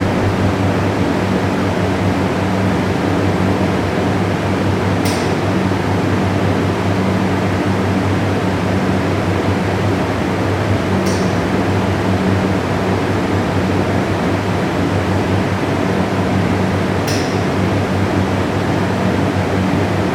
industrial machinery refrigerator walk in internal; sound effects one